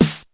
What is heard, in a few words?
909 sd; Snares